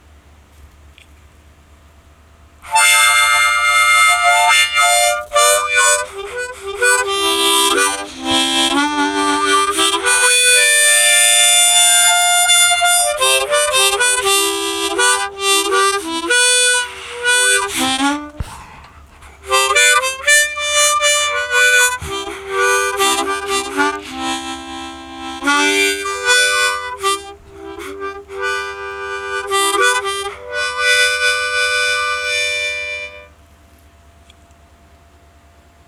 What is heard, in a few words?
Ceramic Slide